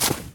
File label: snow step; Golden Crayon Sounds